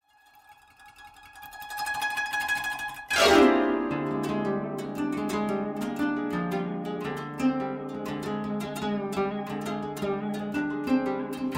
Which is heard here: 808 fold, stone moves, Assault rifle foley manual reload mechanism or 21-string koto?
21-string koto